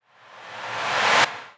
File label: Suckback SFX; Capacitor; GhostHack; Drum Mega Bundle; Abstract Percussions Volume 4